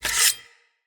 Dagger Equip; Weapons; Dual Daggers

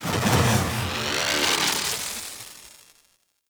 Cast; Focus; Madurai

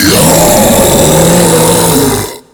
Infested Ancient Taunt Scream; Warframe

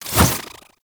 Multinade Initial Throw; Trapper

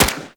SMG Shot; Warframe; Tenno; Sundial SMG; Weapons

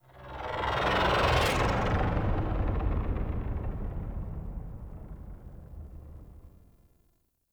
Ship By; Warframe; The New War; Zariman; Apartment; Scene Six

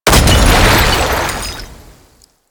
Sabotage Coolant Pipe Burst; Warframe; Missions

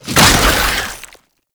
Underground Attack Tentacle Emerge; Warframe; Abilities

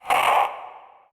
Vocal Pain; Warframe; PriestQuest; PossessedRedVeil

